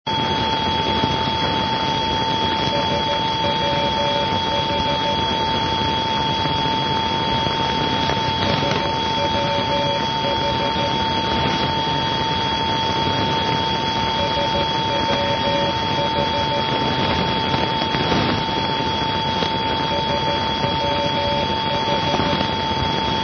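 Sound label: NDB non-directional beacon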